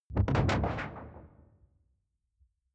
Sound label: sci-fi alien wobble slow; swooshes-swishes